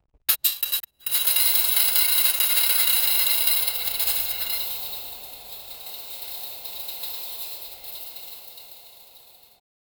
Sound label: ambient torch flickers ov